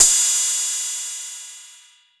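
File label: TR606 Cymbal, Roland TR-606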